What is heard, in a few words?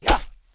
horseman attack; propack